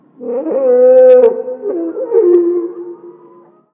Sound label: Ambience dino